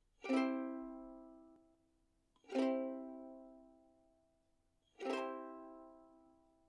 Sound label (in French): Ukulele C Accord